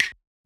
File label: Percussion; FLPRO